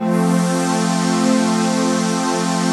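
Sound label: chord pad, Pads; Best Service; XX Large - Pads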